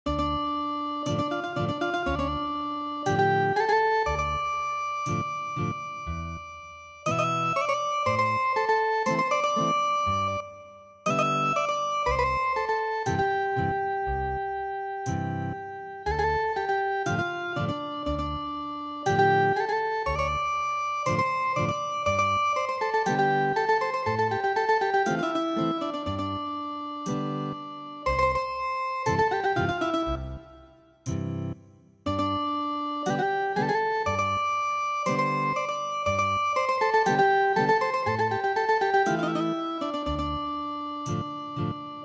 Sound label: Ethnic Guitar, midi traditional